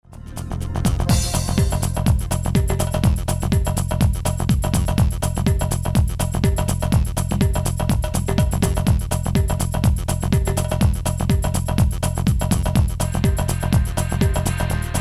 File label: Electric Guitar Vibrochamp